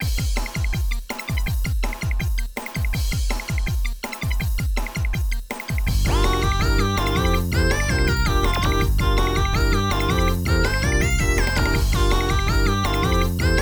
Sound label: Stage BGM